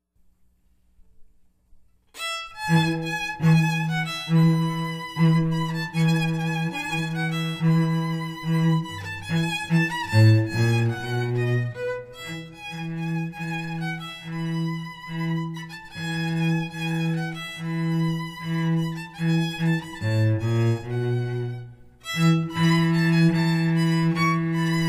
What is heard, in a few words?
strings